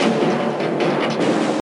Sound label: Desert Percussion